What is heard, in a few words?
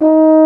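baritone D#3 horn; InVision Interactive; Lightware VOL-1; Instruments & Percussions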